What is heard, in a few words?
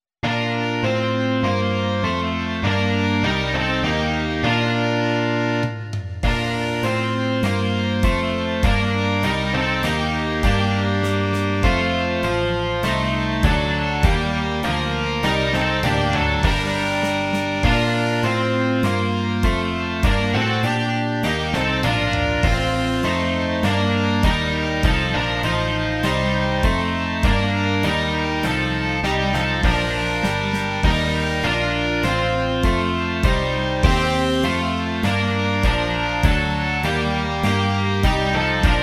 rock